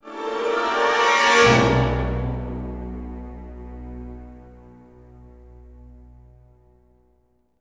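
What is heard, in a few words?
Music Ui Deathstinger; Star Wars Episode III Revenge of the Sith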